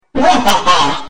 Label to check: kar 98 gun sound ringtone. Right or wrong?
Wrong